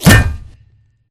Imp Bullet Light Ballistic Solid Metal

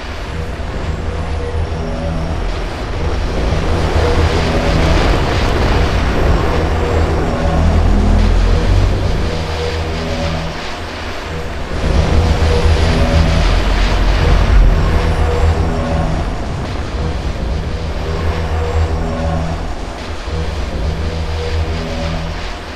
straton hover; Titanfall 2

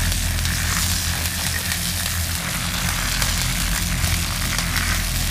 electrical death panel; Titanfall 2

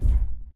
footstep jog; Titanfall 2

